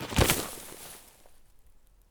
bodydrop grass low; Escape from Tarkov

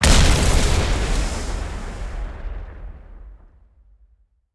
heavy ground explosion layer

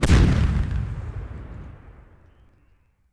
Artillery Fire; Civ4 beyond sword

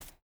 footstep barefoot concrete jump start; Rust

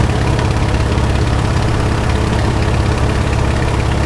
wildcat cockpit, engines; War Thunder

